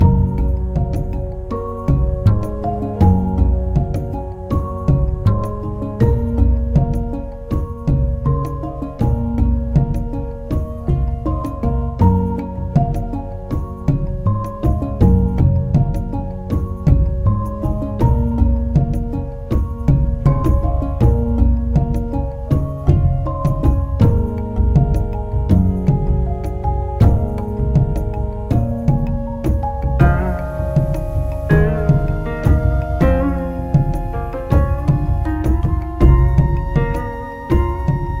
music menu; inlogic-zootrivia

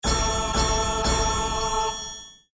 reward Carrot; inlogic-bunnyquest

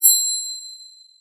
shield collect; Ninja-Action-2

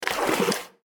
tile move; inlogic-bunnyquest

